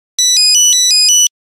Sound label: Do-mi-so, Nokia Buzzer; Windows Phone apps